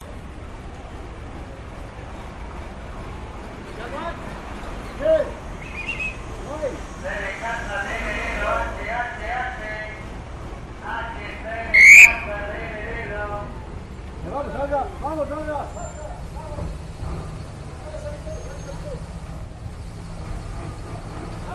people yelling spanish walla man talking on megaphone whistling street traffic; la paz; your free sounds